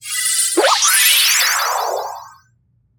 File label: heal spell